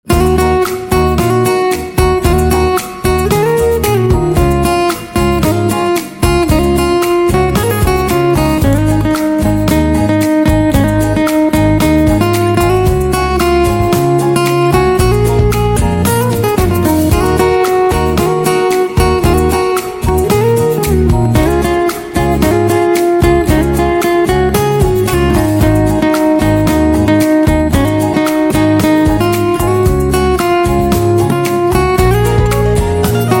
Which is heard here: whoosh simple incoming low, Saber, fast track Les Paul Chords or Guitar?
Guitar